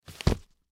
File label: Telephone Book Down, Telephone Book Set Down; Offices; Foley; SoundEffects-Blastwave